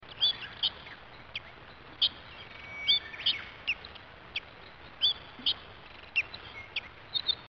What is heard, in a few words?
birds best song; animals